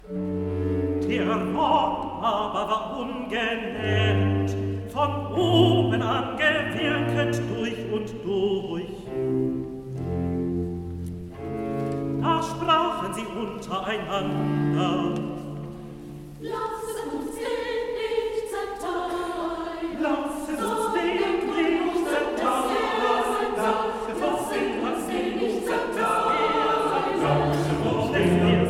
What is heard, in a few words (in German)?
Recitativo Chor; Lasset uns den nicht